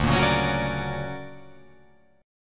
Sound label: D Augmented Major 7th down, guitar